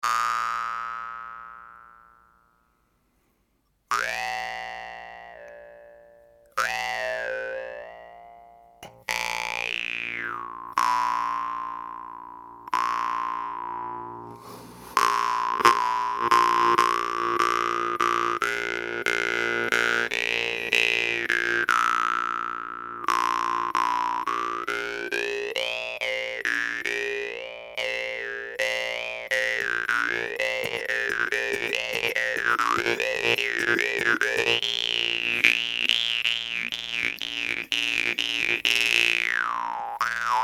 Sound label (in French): ALTAI BASS, guimbardes